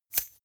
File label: chain mail impact soft